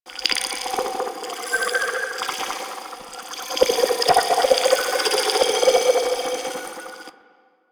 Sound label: Primary Proj Loop, Weapons; Warframe; Cephalon